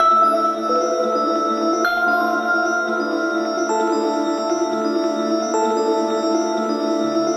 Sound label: Loop